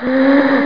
Wheeze; Project Asthma

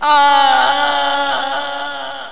AHAH, BEACH; PARASOL

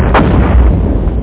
explo; workaholic

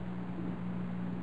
Electric; U-Boat 1.5.3

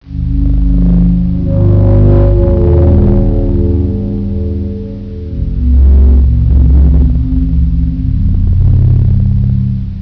Mystery; WARLOCKSNDS

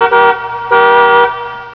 Car Sound; Mosaic Magic

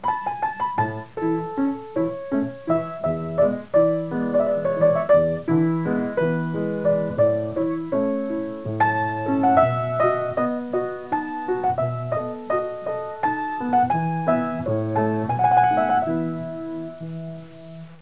minuet

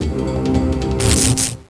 zap; Renaissance

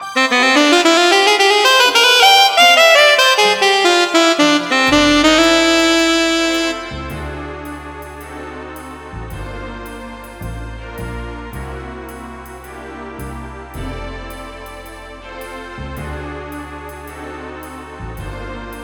SAX

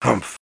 NON COMMITAL, noise; Spades Deluxe

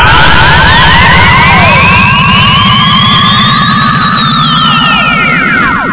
machine; SENTINEL; dosgames

